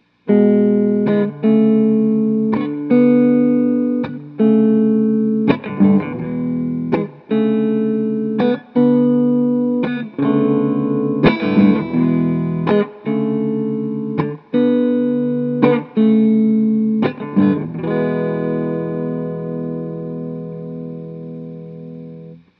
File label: Clean